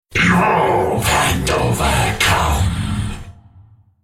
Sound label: Droid spellcast effort